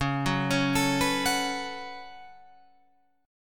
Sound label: C sharp 7th Suspended 4th Sharp 5th, C sharp, down, Guitar, Open F; arpeggiated